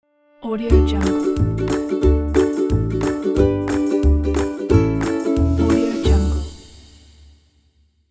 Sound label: ukulele logo